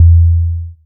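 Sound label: KICK IN E SOFT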